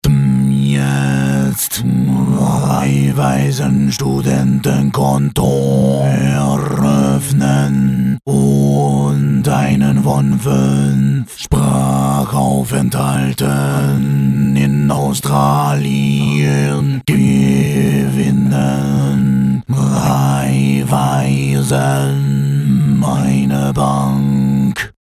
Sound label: Didgeridoo